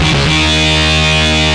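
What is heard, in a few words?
guitar electric; MOBICLIC 14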